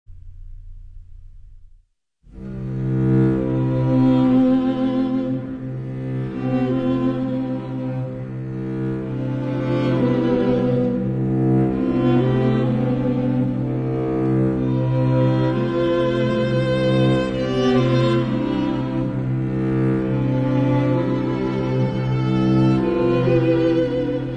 String Quartet, Medley; The Bells of Christmas- A Medley of Two Modern Carols

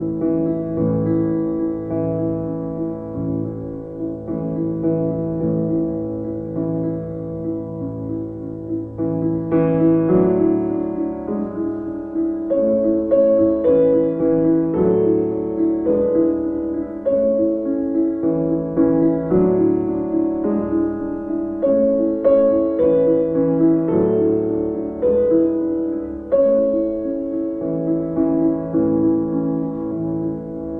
piano, Berceuse (lullaby); Alan Marks

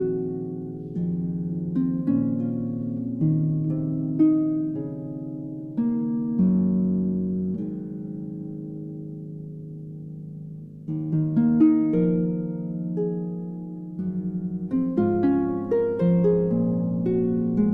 Harp Concerto Lullaby

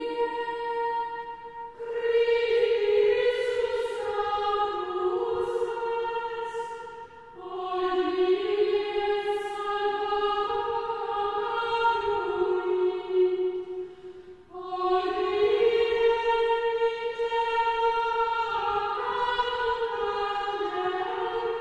Chant Antiphon; Traditional; Chester Processional

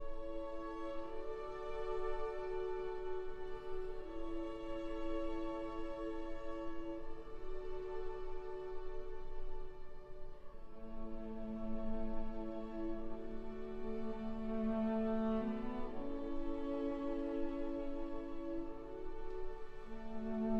for cello and strings

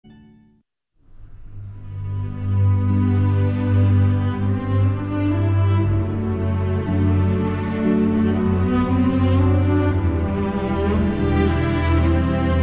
Symphony Orchestra, Arioso for Strings; Bournemouth Symphony Orchestra; arr. Stokowski

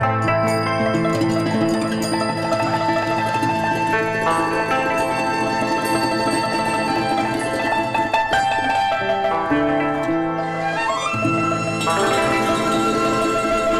TRADITIONAL Chinese, Picking Flowers

